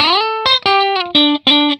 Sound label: RIFF 120, Vintage Blues Guitar; USB Soundscan vol.22